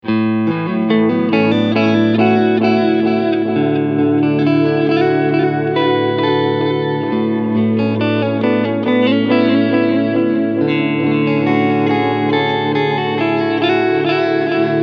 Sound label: Medium Delay